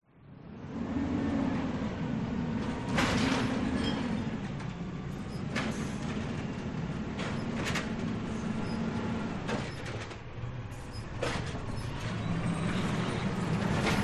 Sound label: garbage truck approach emptying trash cans